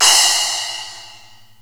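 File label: SPLASH, cymbal; 300 Drum Machines; Zoom RT-234; Kit C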